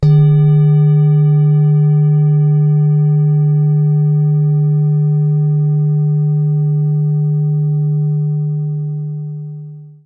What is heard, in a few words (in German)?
Klangschale; Vesta; Uranus; Liefeld